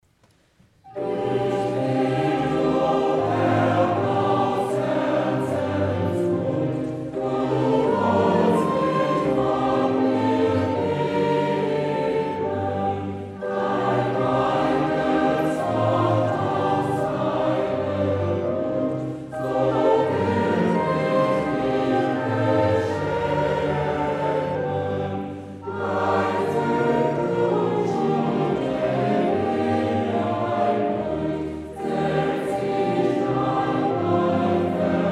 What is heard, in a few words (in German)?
Choral Ich bitt o Herr; BWV 018; Kantatenkonzert